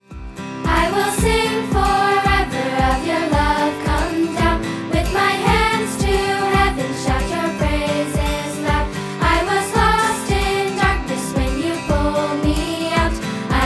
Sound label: instruments